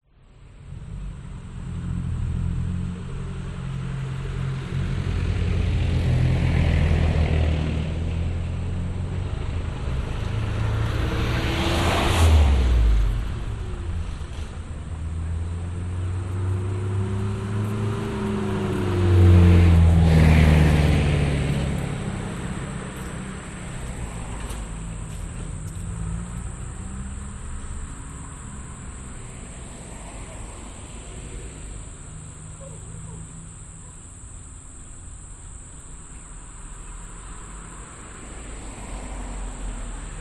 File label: public places town small summer traffic pass few people insects birds montville; australia